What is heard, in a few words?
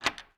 Bolt Lock; 99Sounds; The Warehouse